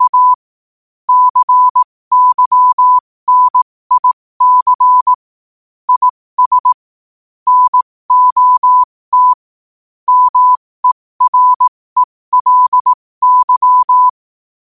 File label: 5 wpm, morse